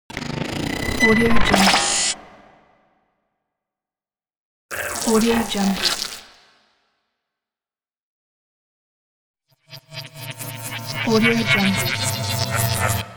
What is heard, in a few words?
glitch sfx